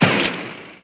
ARROW; DarkOmen

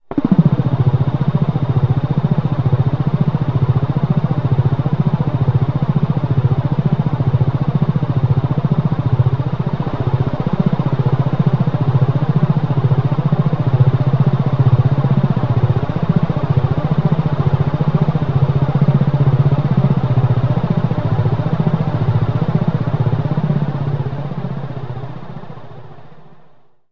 in ship, space; Select Soft Publishing Stock Clips Multimedia Clips

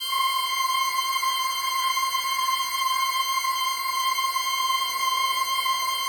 Pad; Cyclotronic Resonator; Ether Layers

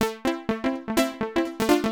synth house 125 A; eJay; Keys

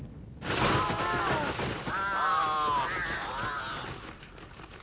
caw; ninja scroll

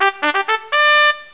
Sound Trumpet Fanfare; The Amazing Spectacular Ordinary Bottle

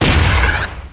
implode

